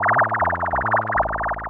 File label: SNTH BASS ELCTR 140 A, Techno